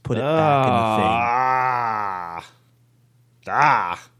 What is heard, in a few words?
disgusted sound